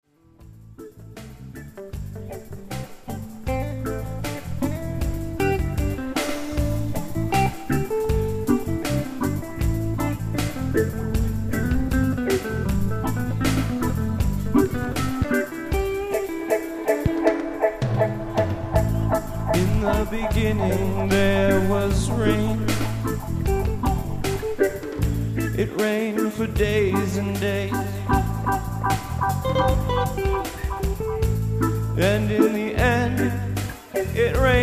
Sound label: Big Water; MoodDocs